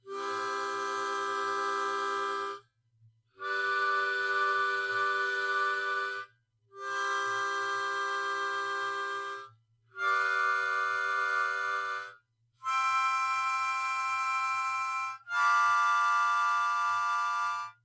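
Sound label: Chords, harmonica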